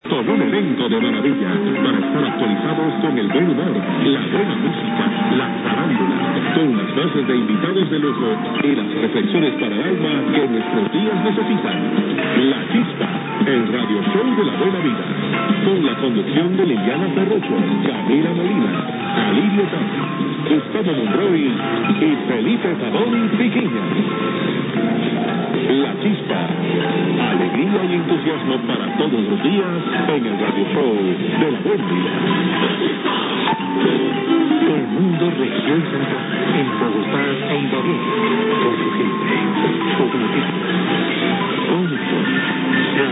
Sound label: Marimba Anthem